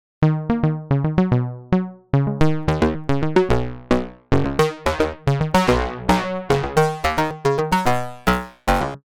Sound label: Cross Polyphonic FM